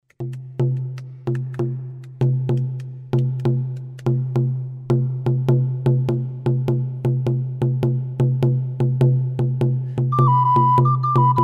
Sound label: North American Flute Percussion